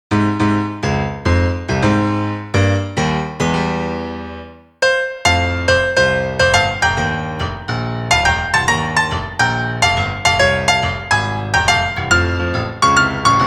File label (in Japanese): piano